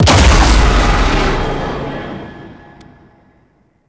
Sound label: Ally Death Start; Warframe; Tenno